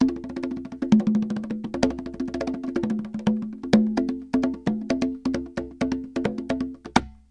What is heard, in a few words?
CONGAS; Mäusejagd Interactive Demo; SOLOS